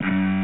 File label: Incorrect; quiz tonosama no yabou 2 zenkoku ban cp system arcade